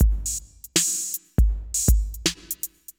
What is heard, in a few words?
Synth Loop, BPM 80